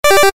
ポーズ レトロ モノラル

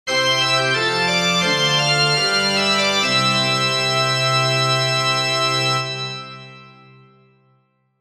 教会 祈り パイプオルガン

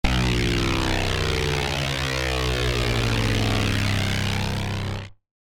プロペラ機通過

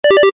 ポーズ レトロ

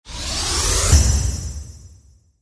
ガチャ インパクト タメ有り

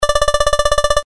会話

